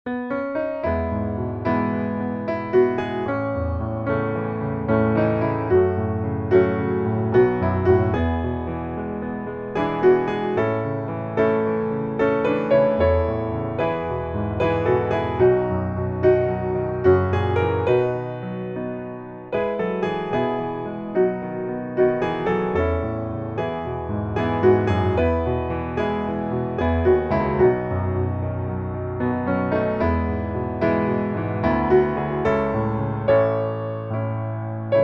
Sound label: piano